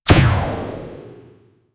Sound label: short soft missile launch, explosive tail